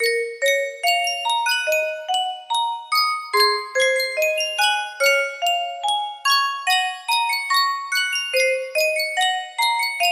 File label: yunsheng custom tune music box